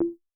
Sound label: Perc Reso, Vermona Mono15 Drums